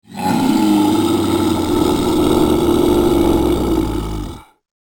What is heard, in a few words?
scary monster growl roar